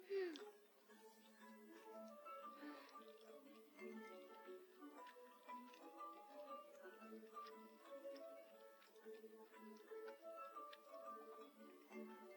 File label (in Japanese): 咀嚼